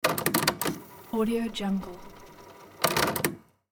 audio cassette player rewind and stop

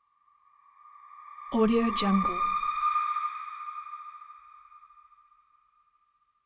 demon scream by soft pass bys

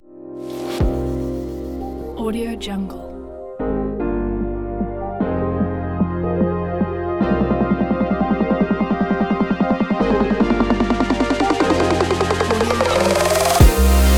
future bass upbeat; cvirin

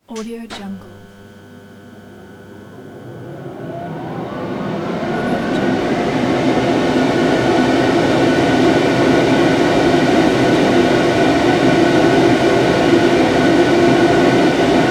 strong ventilation

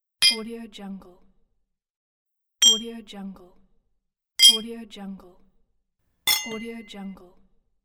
glass clink

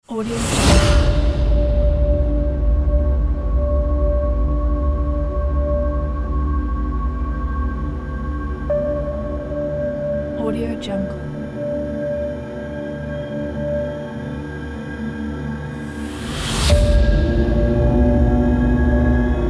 horror suspense trailer

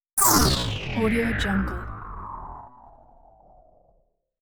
space ship engine off; hollywoodedge

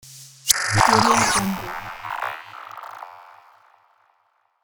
electronic drive transition glitch

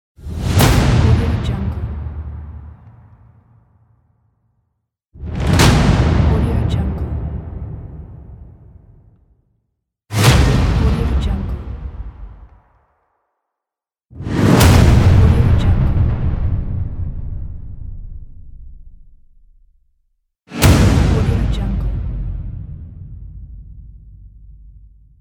impact

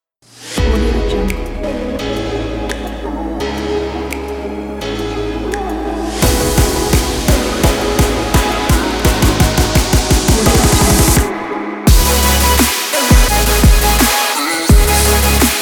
future bass

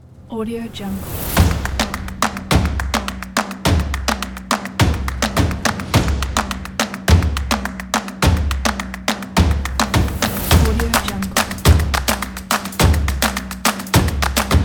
percussive

